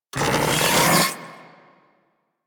Charge Up, Auto Crossbow; Warframe; Tenno; Prime Ballistica

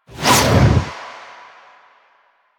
Stalker Scythe Whoosh; Weapons; Warframe

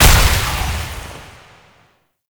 Light Orb Break; Warframe; Eximus; JadeLight

